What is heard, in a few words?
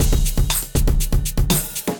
Acoustified Kit, Drums